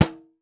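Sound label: LARGE BRAZIL, PANDEIRO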